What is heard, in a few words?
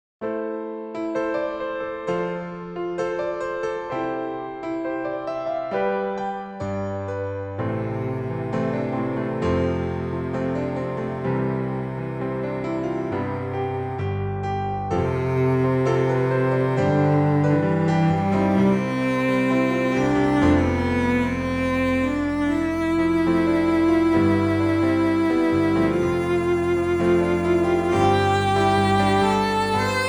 cello and Piano